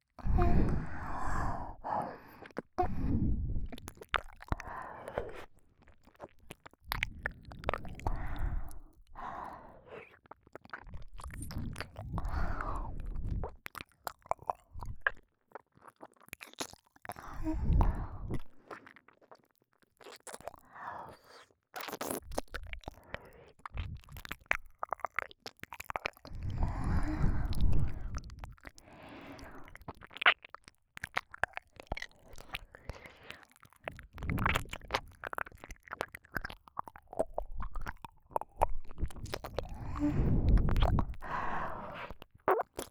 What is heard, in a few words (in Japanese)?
ゆったり耳舐め; ユイ